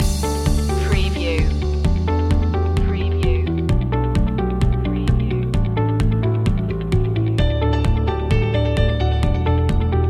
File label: corporate technology loop